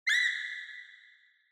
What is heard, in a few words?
Horror Scream High Reverb